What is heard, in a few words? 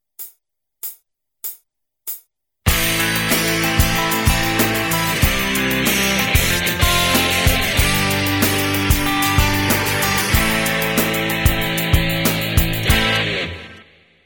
pent4 jam